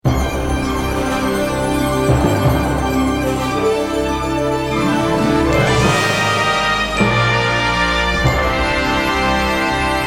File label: Fanfare; German United